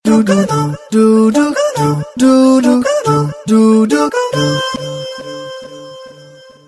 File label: Docomo Sms, ringtones; Mobile Ringtones